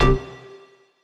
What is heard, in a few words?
Dance Mega Synth; Best Service; 90 s Sample CDs